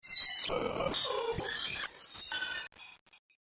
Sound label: Scrambled Radio Signal Tuning Static; Scrambled Radio Signal Tuning Static Scifi Technology . Science Fiction; Noise; Electronics; BBFX